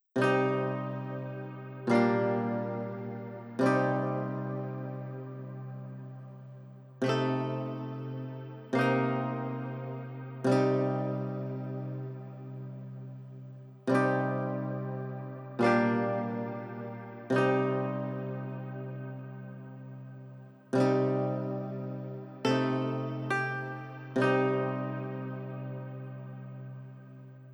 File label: Light Ambience, Fantasy RPG Tracks; Free 25 Fantasy RPG Tracks Vol. 1